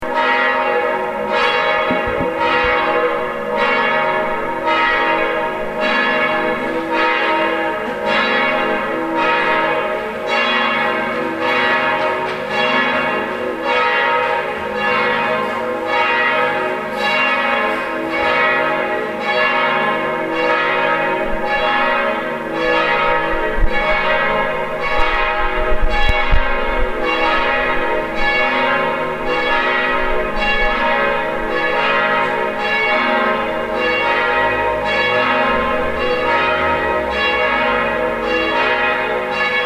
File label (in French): cloche mariage